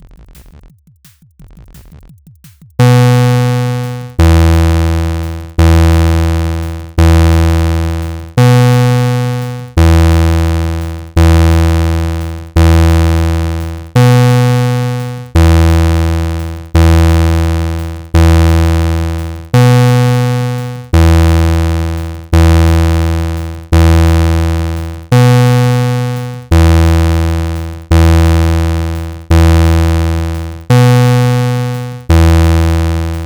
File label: chainsaw